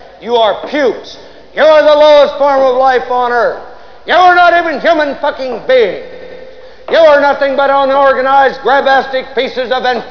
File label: pukes